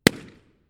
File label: reverb Green Bowl; Pitzer